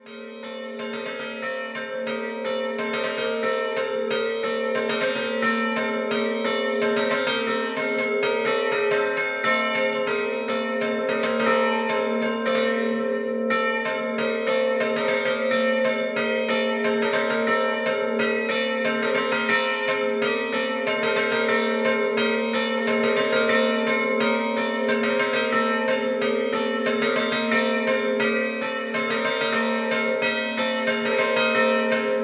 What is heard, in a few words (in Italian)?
Giavons, campane